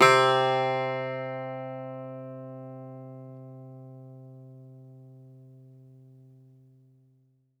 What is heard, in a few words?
Greek Bazouki, plucked strings